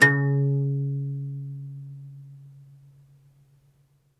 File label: OUD C#2 -R; E-MU Producer Series Vol. 7 – Old World Instruments